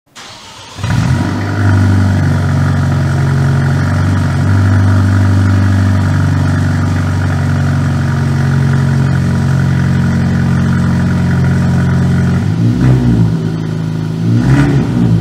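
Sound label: Talisman, car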